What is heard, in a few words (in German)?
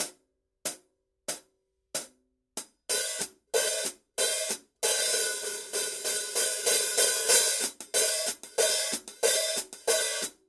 Paiste 13 PST 3 Hi Hat Becken